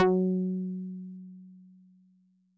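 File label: Solid Bass DX100 F#4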